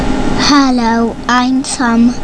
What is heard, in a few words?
tom